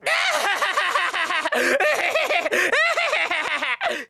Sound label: laugh